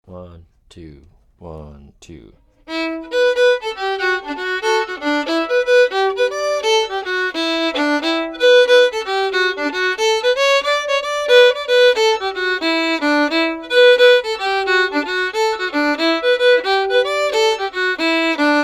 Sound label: jig fast